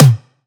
Tom Mid; Antidote Advent; Infected Track Drums

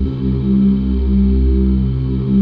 AHH PADS; Pro-Rec - Synthsonic

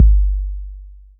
808 KICK, Techno Trance

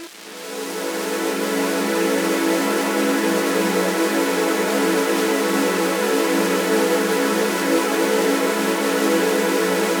Noisy Pad Fm7

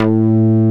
MOOGY BLUES, BASSOUNDS; Masterbits; Dance Trax

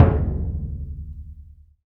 AFRO DUNDUN, LRG DRUMS

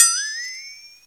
FLEXTONE, Ragga & Dub Reggae; USB Soundscan vol.27

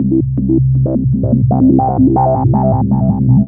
ANALOG ECHO; Zero-G Ambient 1

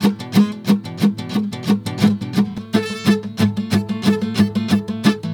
GUITAR, Balkanish Traditions

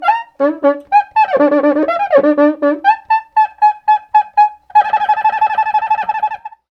RIO, Rio Batucada

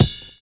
BUZZ SNAP; Univers Sons - Kitch 70s

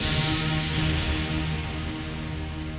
HIT, Hip Hop and R&B Drumloops; Best Service ProSamples vol.01; AKAI

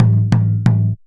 JUNJUNBA HIT; Best Service; World Colours

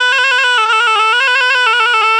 Shenai, Wind Instruments; Sonic Foundry (Sony Creative Software); World Pop

